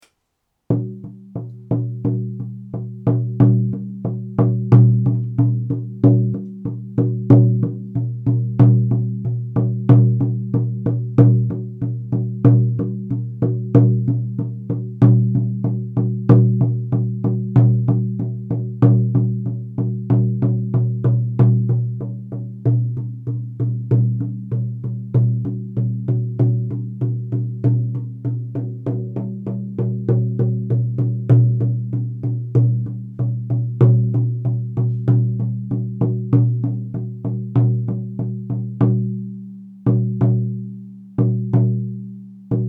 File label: Red deer Spirit Song, drum